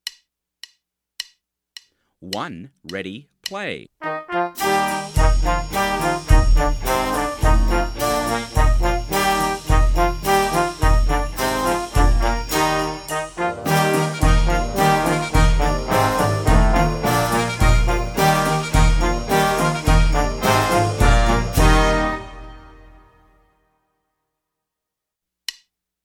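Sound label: Play-a-long, quarter note = 107, trumpet; SOE Bk 2; Turkish March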